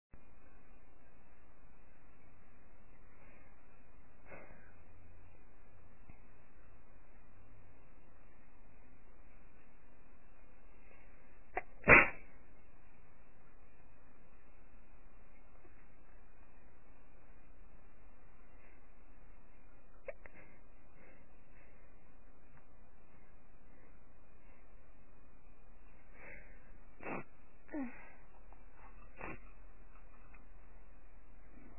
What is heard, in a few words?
lil sneeze BIG honk, female